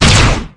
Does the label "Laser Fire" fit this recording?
Yes